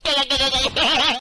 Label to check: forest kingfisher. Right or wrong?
Wrong